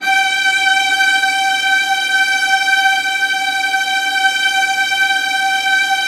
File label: VIOLAS; ILIO; Synclavier Strings